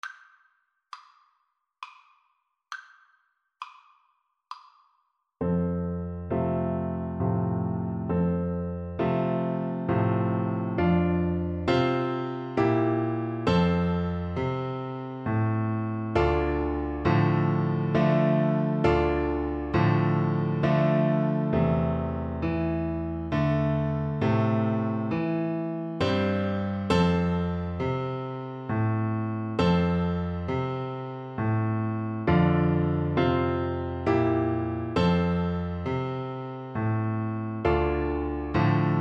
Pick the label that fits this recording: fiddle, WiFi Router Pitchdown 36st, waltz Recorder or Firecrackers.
waltz Recorder